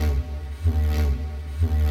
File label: ethno, 125